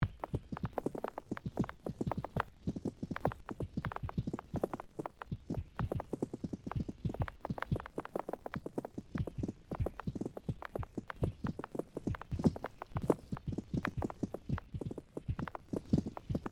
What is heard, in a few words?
Created Ambience Stepped Tremor; Loop; Various Ambience created through sound manipulation in FMOD; Artificial